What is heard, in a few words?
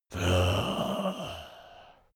zombie moan idle